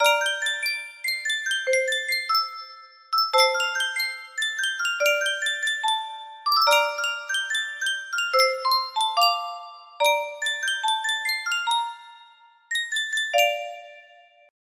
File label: Sankyo music box, music box melody